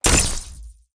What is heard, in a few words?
imp electric stick